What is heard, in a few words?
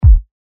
boom